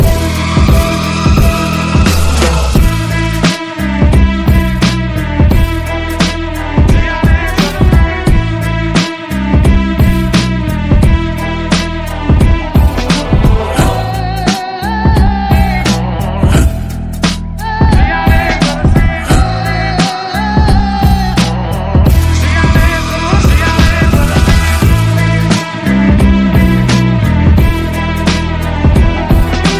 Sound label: Theme Music; Scam 1992